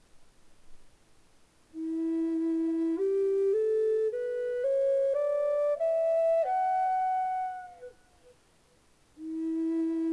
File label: cedar e, flutes